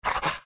pant big, greevil; courier

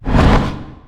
blink in; weapons; dota; antimage

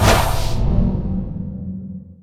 meld; templar assassin

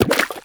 fish flap; ambient; dota

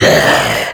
death troll common, creep deaths; dota

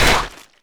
impale target hit; weapons; dota; lion

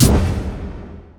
spear knockback; weapons; dota; mars

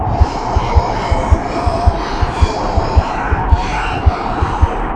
chain frost loop; weapons; dota; lich

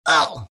pain; rattletrap

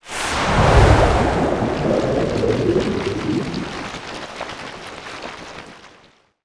amplify damage; weapons; dota; slardar

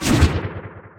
glaives attack; weapons; dota; silencer

